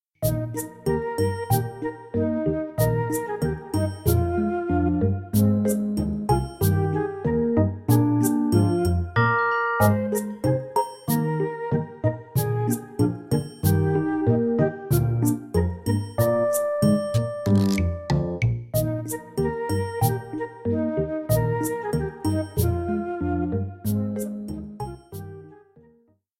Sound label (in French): bande son melodie